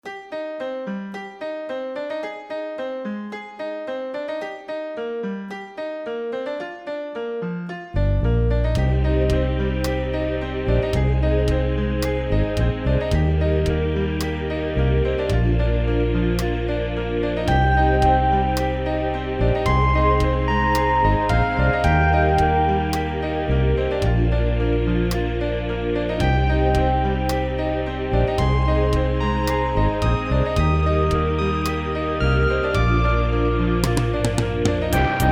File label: OUTRO MUSIC; CELTIC HEART; MSX; PC